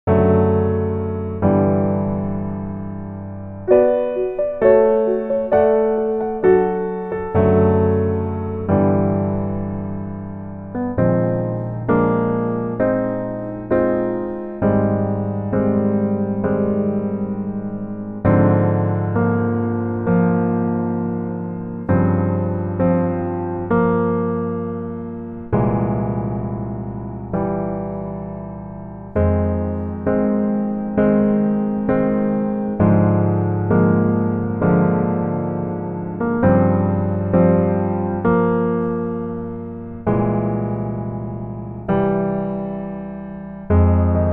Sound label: Piano